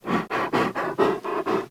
Kubrow Tame Sit Idle Pant B; Warframe

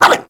Puppy Idle Bark; Warframe; Kubrow

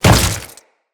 Step Flesh, Footsteps; Enemies; Grineer

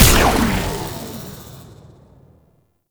Operators Amp Chassis Part C Fire; Warframe; Amplifiers

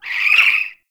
Owl Idle Chirp; Warframe; Duviri; Glyph Puzzle

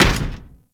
Moa step default; Warframe; Footsteps

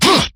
Melee Attack; New War; Erra

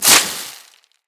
Melee Impact Dirt

